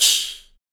SPLASH, Male Perc, Vocal; Spectrasonics Vocal Planet; Jazz FX

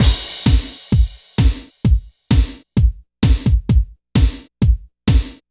80s Funk Beat, Drumloops; Dr. Fink's Funk Factory

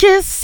KISS VOX, SHOUTS, Funky Vocals; AKAI; USB Soundscan vol.18

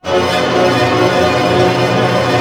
ARP C DIM FF, STR ARP; Sound On Sound; Peter Siedleczek's Orchestra

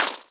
Percs, Elektron MachineDrum

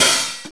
HAT SLOW CLS, VINTAGE FUNK; Zero-G; Funky Elements

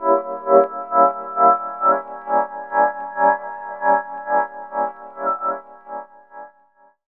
DR. LOOP, TRANCE; X-Static Goldmine 3

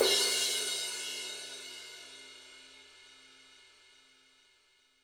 HiHat & Crash, Roland TD-20; HiHats

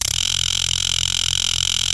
DENT DRIL; COMIC

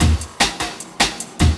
LOOP, 150 BPM, Drum & Bass

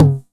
909 NOISY; Wizoo - Platinum 24 Electronic; 909 NOISY P1

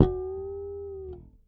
Harmonic Bend One Shot E, Bass; Bass X

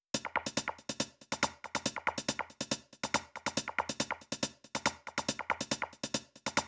Addon Loop, Drum Loops 140bpm, Perc Loops; Trance; Zero-G Trance Inducer; 90 s Sample CDs

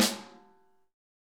SN ambient; Best Service; Real Mega Drums VOL-1

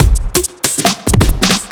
Trip Swing Mix, 140bpm; off the grid

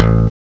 ELEBASS; CMI Sound Library Rev 1.3

